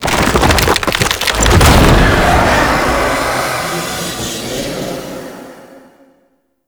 Octopede Loot Crate Open; MITW; Warframe; Duviri Murmur; Octopede Encounter

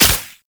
Spiderling Web Shoot; Enemies; Gameplay; Boss; Warframe; Oraxia

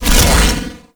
Shield Launch; Enemies; Weapons; Warframe; Arachnoid Camper

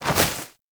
Bird Of Prey Wing Flap Up; Warframe

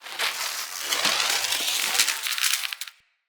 Punch Wind Up; Weapons; Unarmed

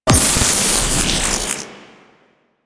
Corruption Pickup Hostile Large; Warframe; Missions; Zariman

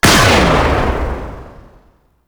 Sentient Sniper Shot; Warframe

